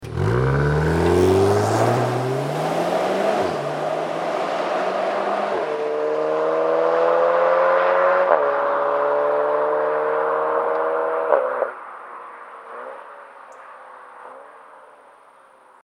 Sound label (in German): RVariant OPF Klappe ZU Losfahren; catback-system golf vii r variant cupra r st 5f; Abgasanlage